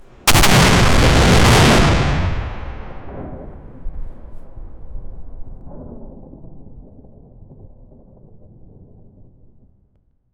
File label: thunderous boom of a cannon firing